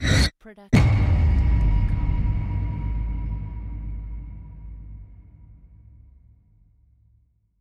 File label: cinematic rise hit short ramp up